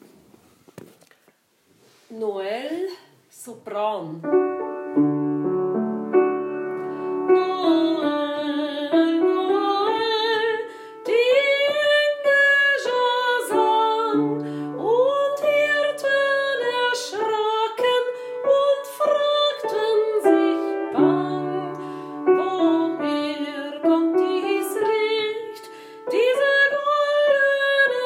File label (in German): Noel Sopran